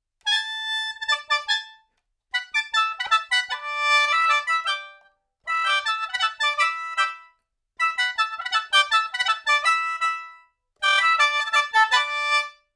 传统笙 乐曲片段; 算盘响

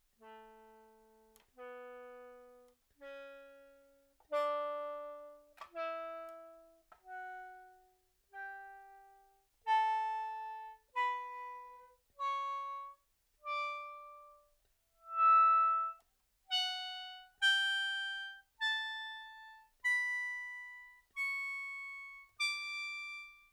传统笙 音阶 速度慢 力度弱

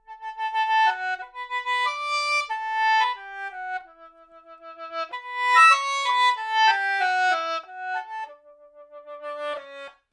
传统笙 乐曲片段

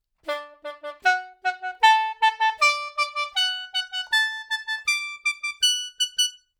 传统笙 演奏技法 三吐

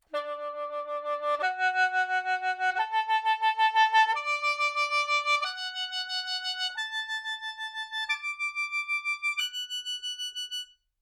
传统笙 演奏技法 喉颤音

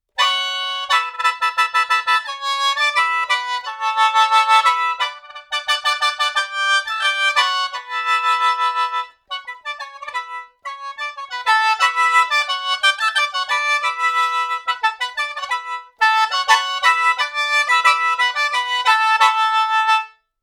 传统笙 乐曲片段; 秦王破阵乐

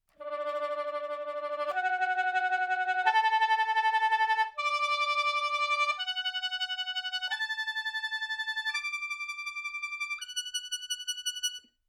传统笙 演奏技法 呼舌

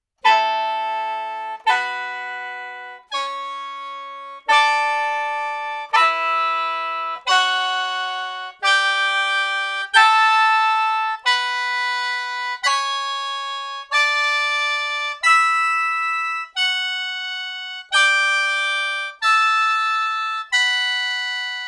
传统笙 演奏技法 传统和音

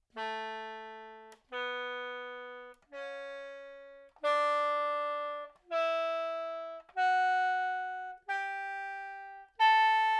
传统笙 音阶 速度慢 力度中强

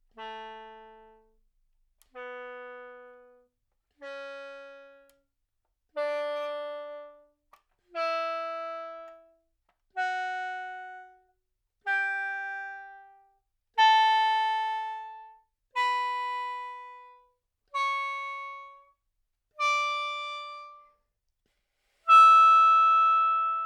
传统笙 音阶 自然衰减 力度中强

